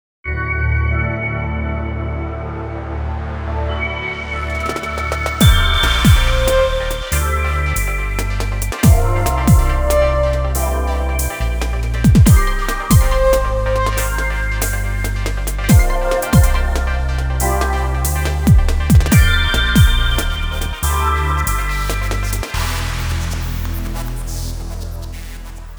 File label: Access Virus Indigo